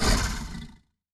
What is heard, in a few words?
Large Creature Short, Monsters; Fantasy Sounds Bundle; Large Creature 13